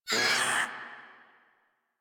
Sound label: Kavat Vocal Pain; Warframe; Khora